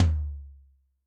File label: Maple, Yamaha DTXpress-II Tom